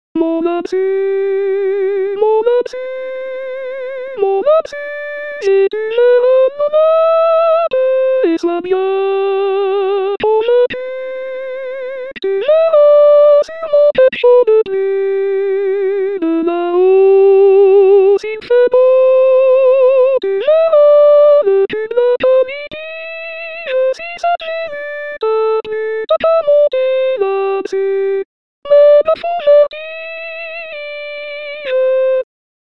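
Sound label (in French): monte la dessus voix; 2018 st jean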